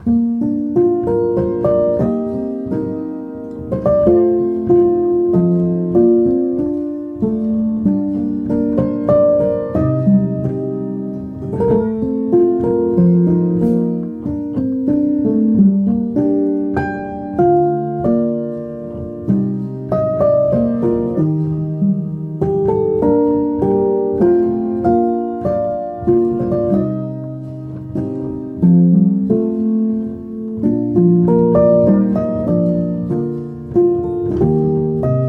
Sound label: song calm nature; dolphin tunes